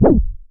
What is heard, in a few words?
MEMORYMOOG